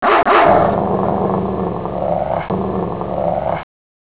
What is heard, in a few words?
growl